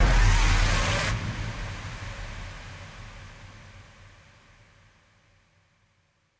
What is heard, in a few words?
Scream